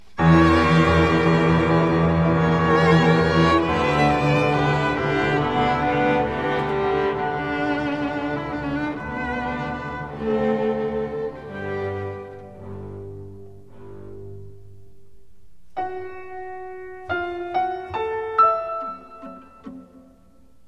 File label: Elektronniy Vals